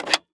Technology Electronic Machine Fax Machine Picking Up Phone